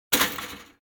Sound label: toaster up; Golden Crayon Sounds